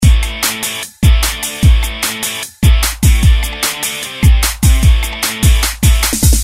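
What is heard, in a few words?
Game loop rhythm; Game loop rhytm; Sound Graph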